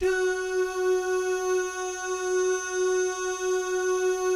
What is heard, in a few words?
DOO GUYS, Vocal Jazz FX